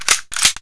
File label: death; garrysmod